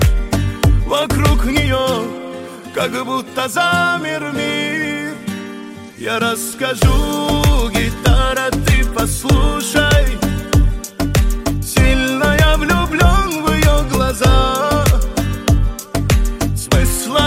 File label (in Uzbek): gitara